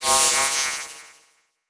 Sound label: Title Transition Laser; Production Element